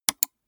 gaming mouse left click single

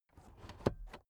car headrest full adjustment unsteady rattle

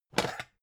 pan take slide short

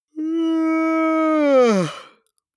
yawn male vocal long; koerpergeraeusche; menschen; soundpool

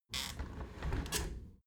window handle squeak turn close halfway soft; objekte; fenster

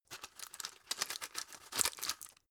drug put in hard; berufe; arzt

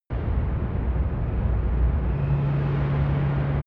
spaceship engine idle noise; raumschiff

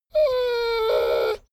dogo argentino whine medium; natur-tiere; hunde

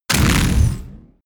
sci-fi plasma cannon fire

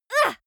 female moan pain very short soft; soundpool

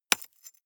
stone rock impact scrape

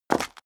step high heels concrete grit jump; schritte; Footsteps

